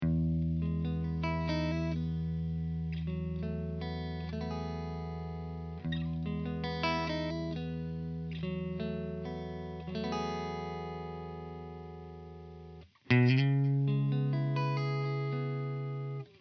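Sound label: Legend V128 Clean, Clean riff; eminence; Clean riff 3; Josh Smith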